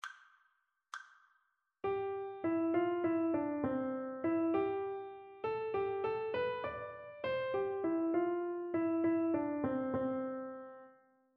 rhythmic dictation; ear training

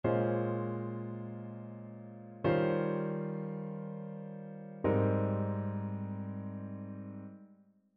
ii V I progressions; ear training

